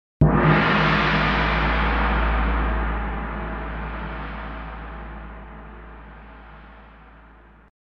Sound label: Asian Gong; Drumwavy; Syntheway